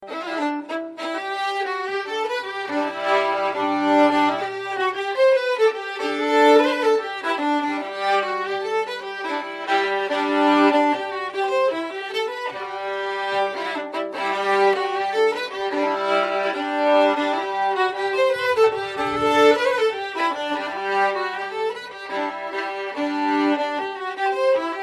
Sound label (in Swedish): Schottis